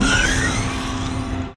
Autodoor

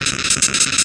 Paralyze; ycrpg

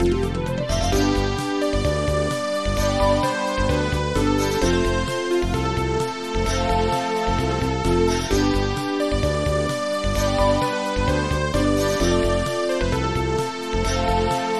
Airship, bgm

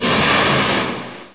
Fire

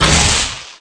Thunder

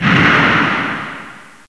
Breath

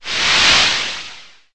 Sand; ycrpg

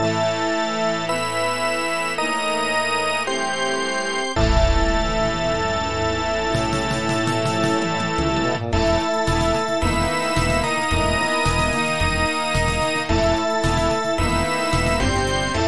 Battle, bgm; ycrpg

